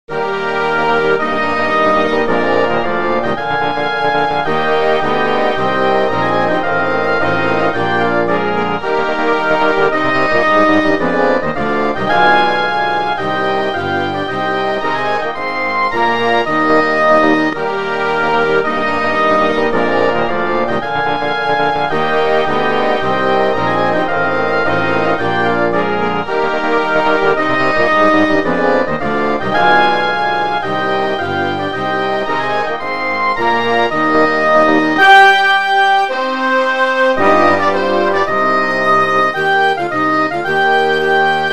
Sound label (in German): Marsch fuer Orchester